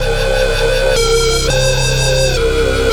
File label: Dark Droner, Keys, Pads